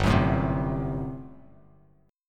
G Major 7th Suspended 2nd Suspended 4th G down Bass Standard; GM7sus2sus4 strummed